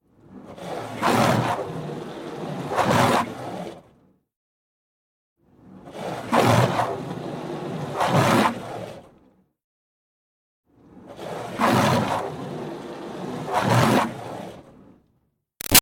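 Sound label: Water Spray Interior Car Bys Hard Med; Car Wash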